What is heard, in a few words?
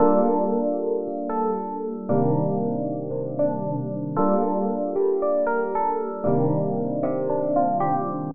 Rhodes Dust BbMaj 115 bpm; Loops; Tonal; Keys; Ableton Live 12 Lite; Core Library